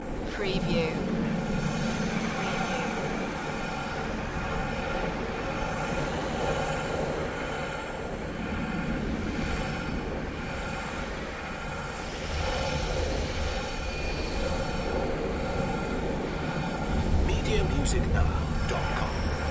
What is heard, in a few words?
abstract wind dimension loop